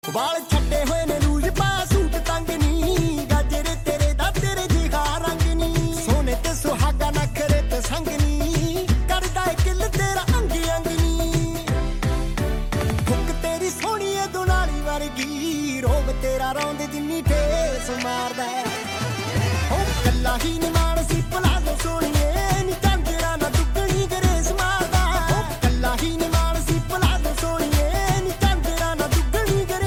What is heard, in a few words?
DJ Punjabi Ringtone